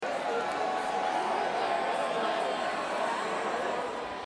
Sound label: Crowd